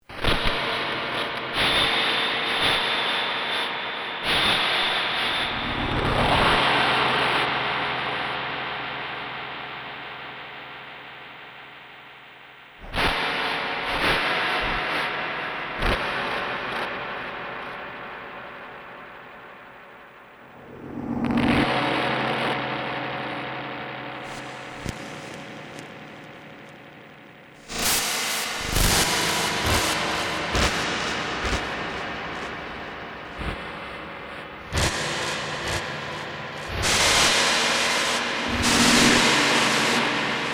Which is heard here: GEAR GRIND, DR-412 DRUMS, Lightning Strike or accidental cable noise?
accidental cable noise